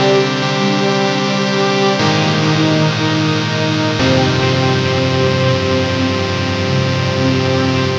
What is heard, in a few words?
String; Strings & Pads; Mesing